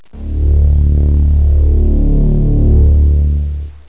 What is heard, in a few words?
BASS; The Ultimate Guide to Mortal Kombat